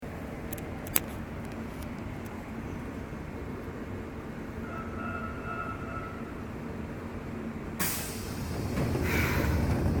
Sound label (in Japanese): 3000形, 鶴舞線開業時に投入されたセミステンレスカーです。; 名古屋市交通局; 上前津〜大須観音